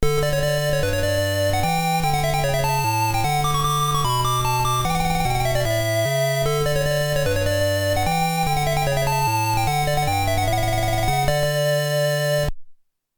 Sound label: Fanfare; The Maze of Galious OST